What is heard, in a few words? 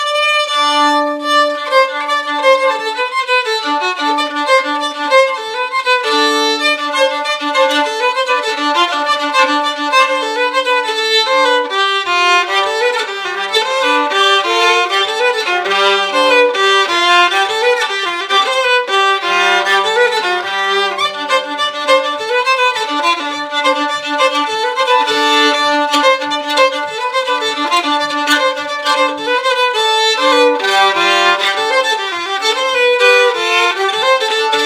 Polka